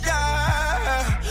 yaaaheh

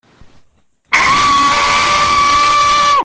pigeon scream

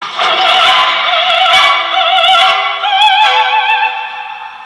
jingle; nana noodleman

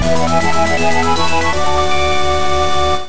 item get

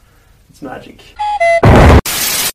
magic

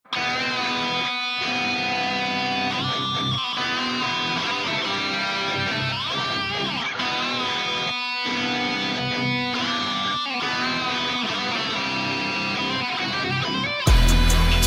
guitar remix

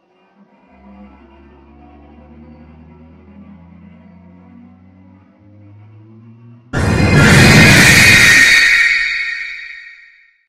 jump scare ghoulish grin

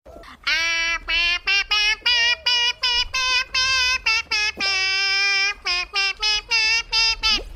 kazoo

